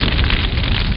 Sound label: flamethrower, weapon